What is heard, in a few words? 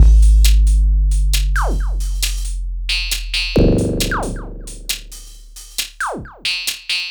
Drums Strange